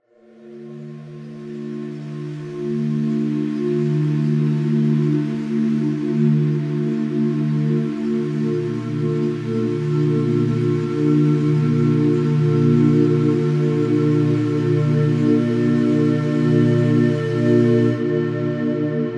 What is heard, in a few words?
Pad; Native Instruments